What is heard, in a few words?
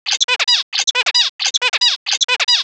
linear Sequence